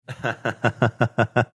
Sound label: lightly chuckling